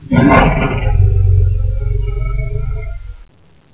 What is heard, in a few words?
roam, Dragon sounds; Unreal